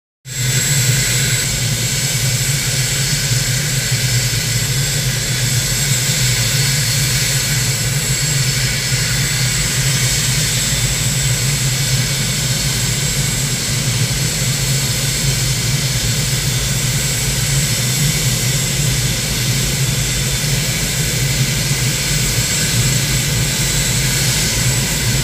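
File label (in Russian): реактивный истребитель ожидание закрытие двери кабины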